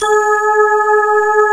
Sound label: D50 Layer, Roland; 90s Sample CDs; Club-50 - Foundations Roland; D50 Syns